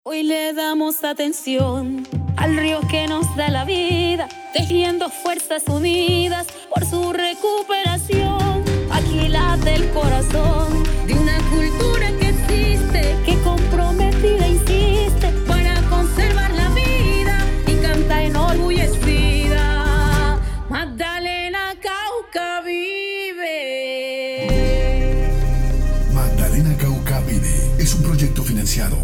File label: jingle